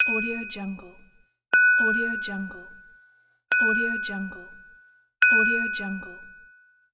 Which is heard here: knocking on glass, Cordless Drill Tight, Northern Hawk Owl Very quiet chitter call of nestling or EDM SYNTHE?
knocking on glass